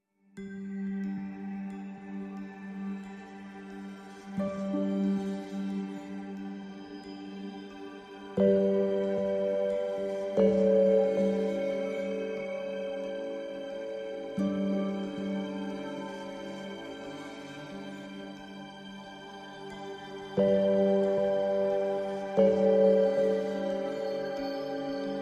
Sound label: No Woodwinds